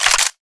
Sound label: pump, weapons, negev; csgo